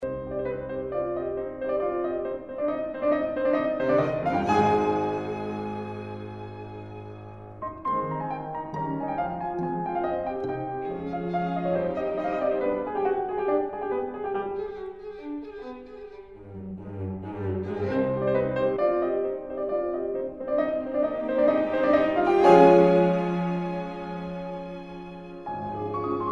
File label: piano qnt; kiel